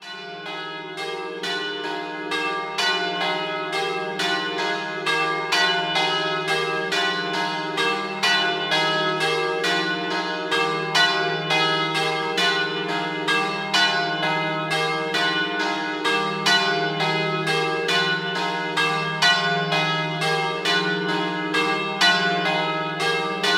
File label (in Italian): Coltura, campane